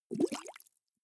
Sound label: bubbles soft